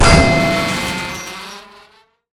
charge collide robo giant

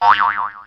fat boing

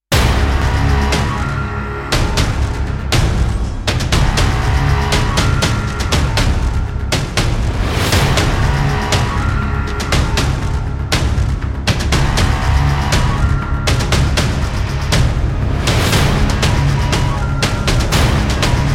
wave entrance music; skullishinvasion; wave24

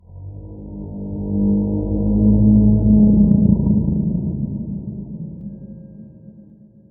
spooky ambient sound

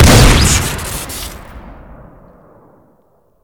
aa12 shoot crit

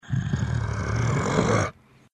zombie low groan; mvm forever

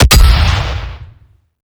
rocket launcher fire alt

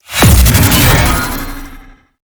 death stinger; cinematic

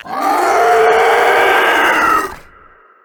leaper dead; trespasser

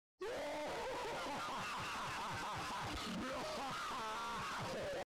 laugh

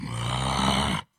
zombie groan

